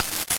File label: Static